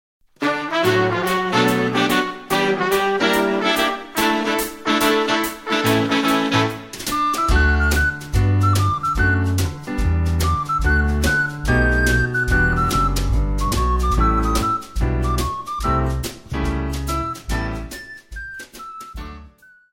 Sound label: Instrumental